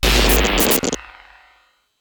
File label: Leakage